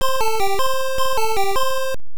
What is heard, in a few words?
Checkpoint; traverse usa; arcade